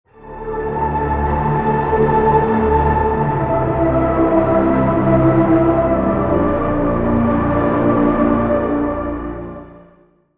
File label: Pad - Emptiness; Routed Sound; Vital Expansion - Quantum Enforcer Vol.1